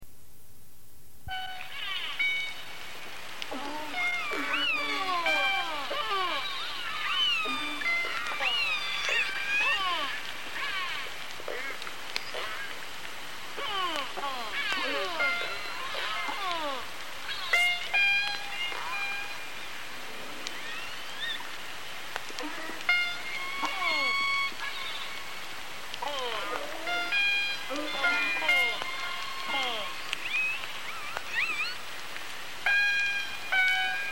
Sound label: Resident Orca I15 Pings G Clan